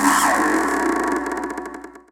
drop To hole; wob2 demo

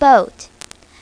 BOAT; My Talking Dictionary

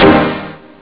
stab; OctaMED SoundStudio V1; rave

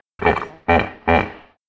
hippo; Immortal

